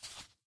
step; smite; god serqet

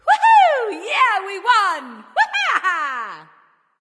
"yay"; smite; nuwa

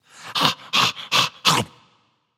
tongue; fenrir

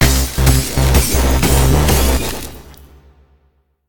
hit damage tick; smite; raijin

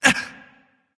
breath; smite; voicepack; janus

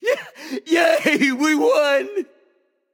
yay; smite; kumbhakarna

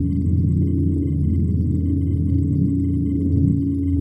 Flutter; smite; god cupid skin13

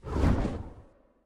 wingflap; smite; thanatos

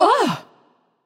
surprised; smite; voicepack; hel

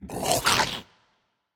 grunt attack, voicepack; smite; jorm

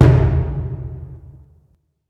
Taiko Mid; smite; raijin

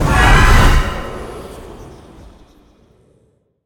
activate; Smite; Zhong Kui

